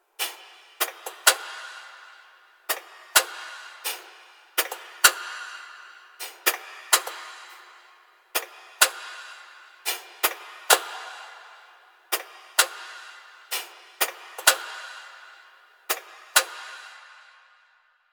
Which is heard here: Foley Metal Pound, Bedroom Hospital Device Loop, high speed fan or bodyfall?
Bedroom Hospital Device Loop